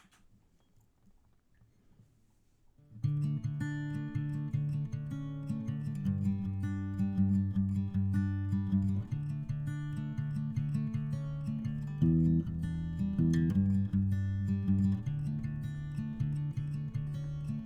strummed, with bass

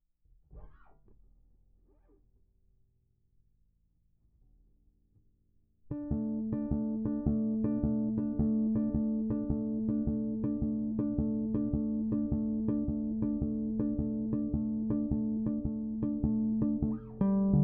bass outro

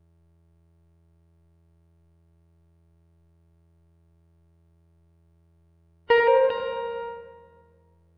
gtr dooeeoo

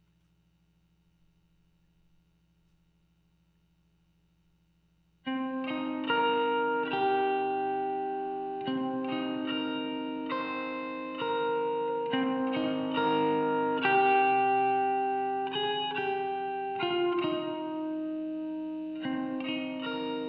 gtr texture

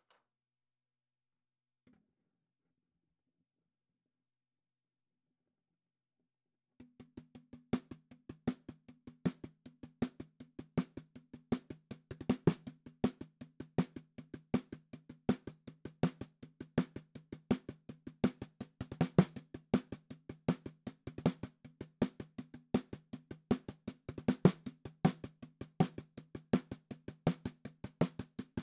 perc intro snare

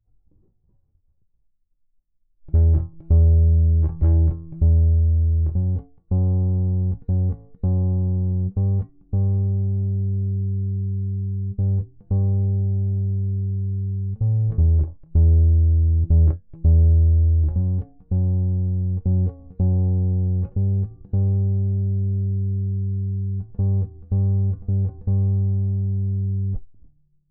bass bridge

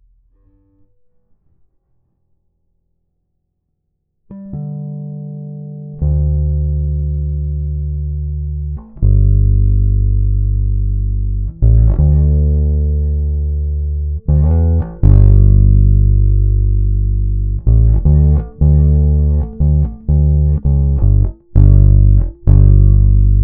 bass riff

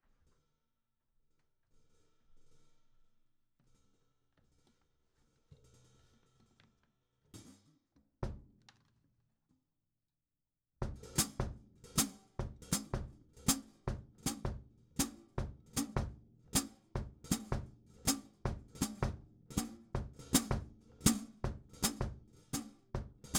perc intro hat

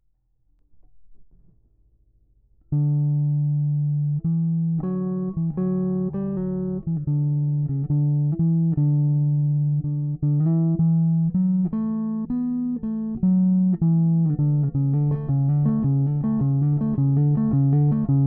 bass outro

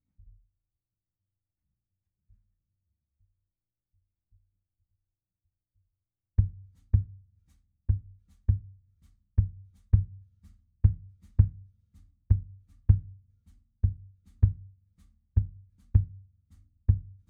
perc intro kick

